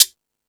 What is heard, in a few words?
Percussion; E-MU Pro-Cussion